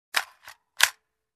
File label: Magazine Reload